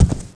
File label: walk